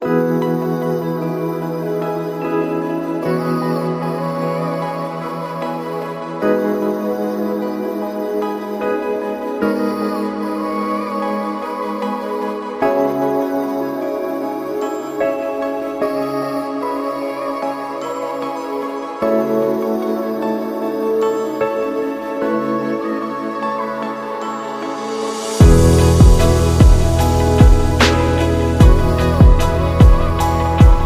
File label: Luxury Upbeat